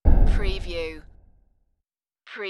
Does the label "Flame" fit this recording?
Yes